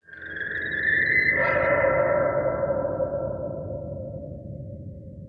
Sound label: dist grub; hl2 ep2; ambient, levels